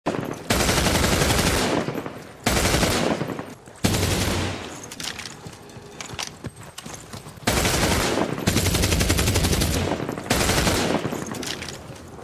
sonido de armas

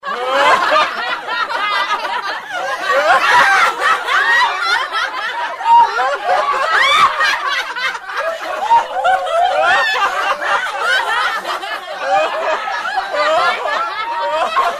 risas contagiosas

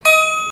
sineta

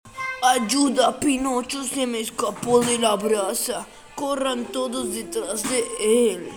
aplausos

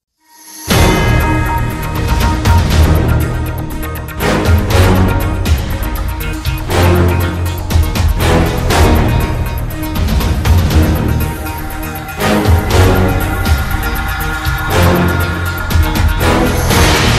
musica menu; csgo

